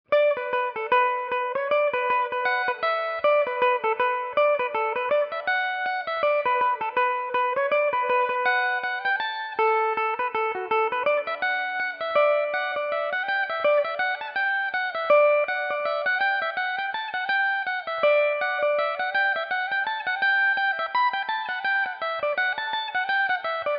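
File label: B minor, irish mandolin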